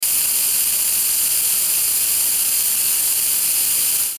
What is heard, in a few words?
static light; Static Light Crackle; Production Element Imaging Element Accent Transition; Blastwave; Static Sound Effects